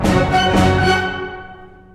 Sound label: F sharp 16th Oct x2; torchlight iii; ps4